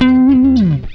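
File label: slide down note, guitar; Gtr Chords&Licks; E-MU Producer Series Vol. 8; Platinum Phatt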